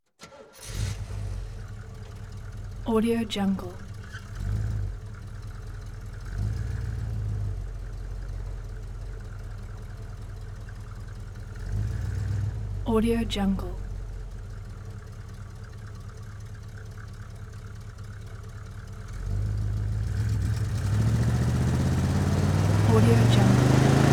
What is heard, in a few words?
1971 chevy chevelle car start